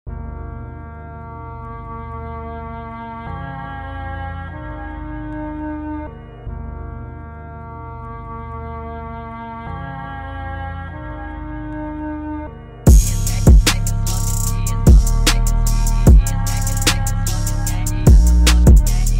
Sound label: PHONK TYPE BEAT